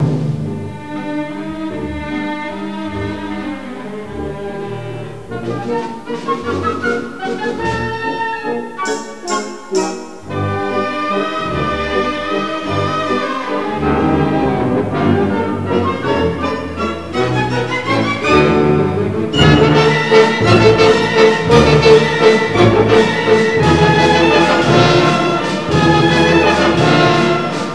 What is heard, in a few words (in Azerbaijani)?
vals